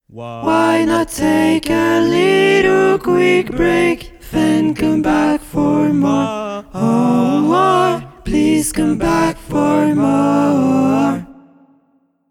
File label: tenor